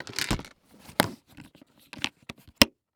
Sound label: Industry Tool Box Close And Lock